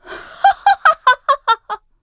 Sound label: Decline Laugh; Fanadi laughing when Link declines a reading; fortune teller